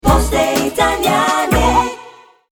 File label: tone shutdown; PosteMobile